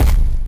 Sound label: curved step